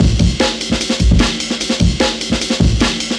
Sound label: 150 Top Tape Break; m8 breaks